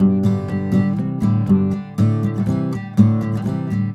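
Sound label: guitar, 120, Celtic; USB Soundscan vol.42; Celtic Flavours; AKAI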